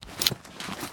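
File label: weapon powder charge set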